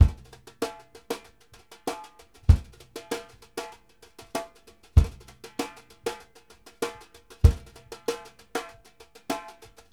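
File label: LIGHT TOUCH, BRUSH 96; Sampleheads - New York City Drumworks VOL-1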